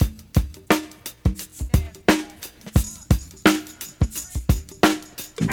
87 bpm Drum Loop B Key, Free drum loop sample - kick tuned to the B note. Loudest frequency: 1802Hz